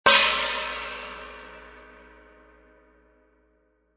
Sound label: 18in China Low, cymbal